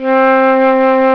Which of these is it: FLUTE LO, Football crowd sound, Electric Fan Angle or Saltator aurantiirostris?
FLUTE LO